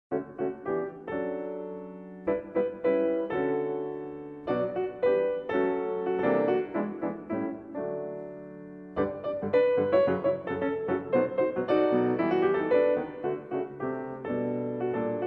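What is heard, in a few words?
Allegro Vivo, piano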